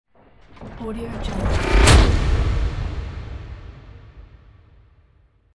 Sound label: dark kaboom